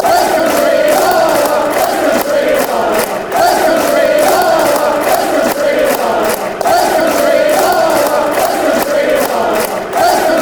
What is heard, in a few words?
west country la la la, fan chants